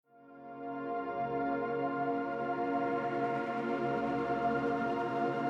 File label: Grain Field (Instrumental Music)